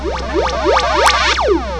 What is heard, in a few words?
fin level; childsplay plugins; PackidData